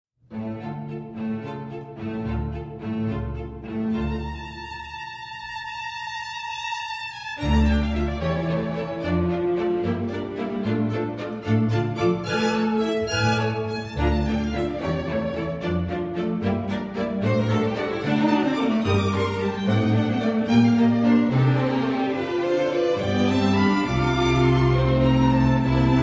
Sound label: scherzo for strings